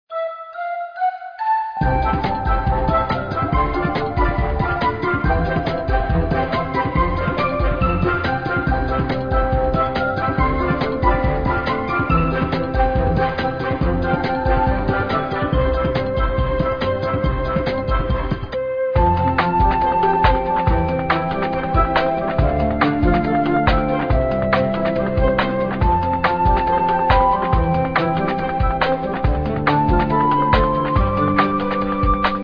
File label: bgm; 红包雨